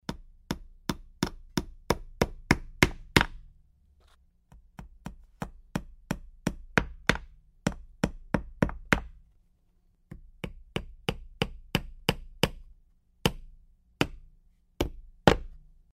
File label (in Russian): molotok